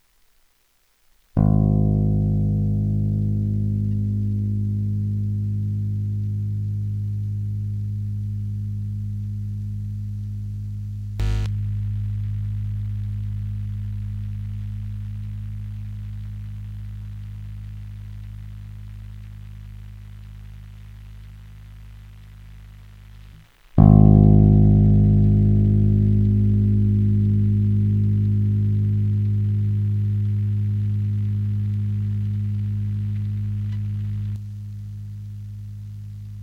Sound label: Coil on NOTE then off NOTE then coil on again, guitar pickups